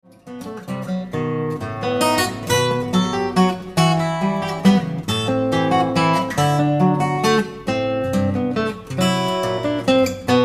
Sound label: Waltz